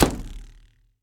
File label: Arrow Hit, Longbow; SteamVR; InteractionSystem